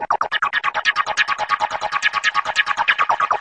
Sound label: 141 plasma synth loop; SYNTH AND ELECTRONIC LOOPS